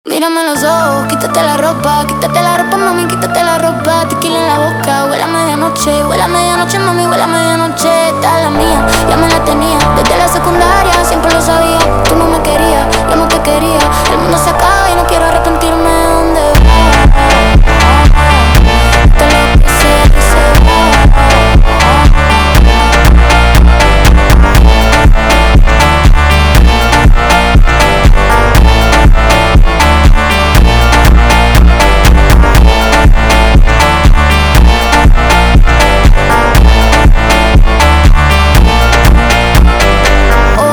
phonk music

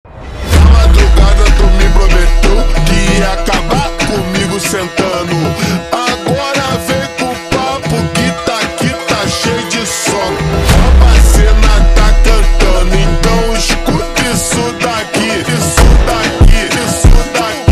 slowed, funk

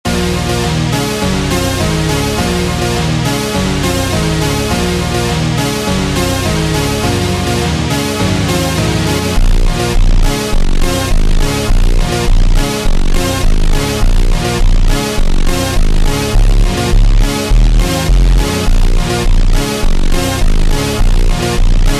Automotivo ultra Slowed, funk

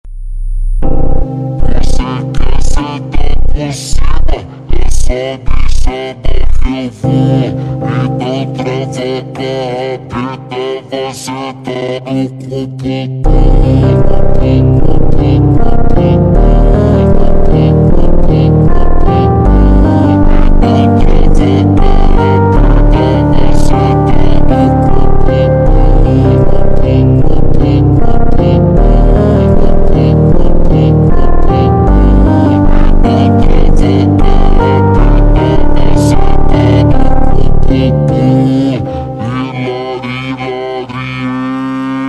extreme Slowed, فانک

